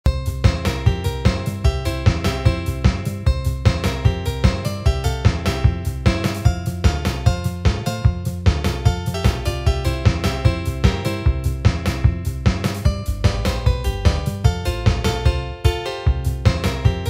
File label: Party Blues; Party Bomb; Wynncraft